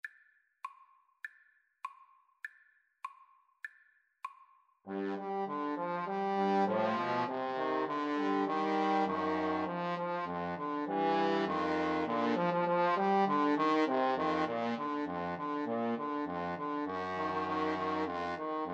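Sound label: 3 trombone karaoke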